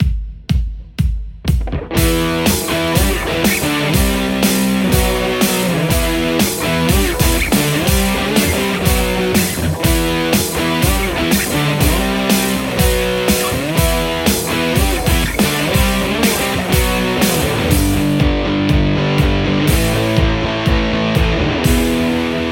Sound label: white strat; igniteamps; the anvil